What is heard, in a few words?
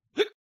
Drunk Hic